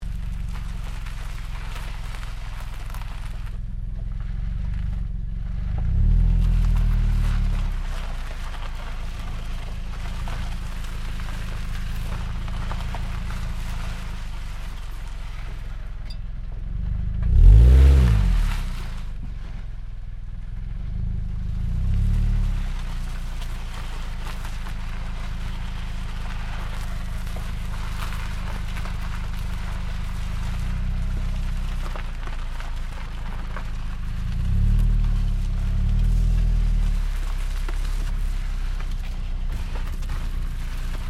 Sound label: Car Toyota Corolla maneauvering exhaust gravel, hatchback; 2009 Toyota Corolla hatchback; Cars